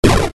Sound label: Sound Firework; gamerip; Dream Tower